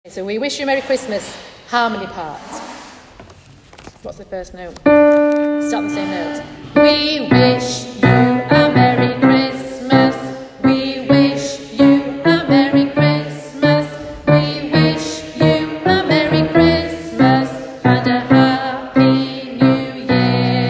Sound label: we wish you merry harmony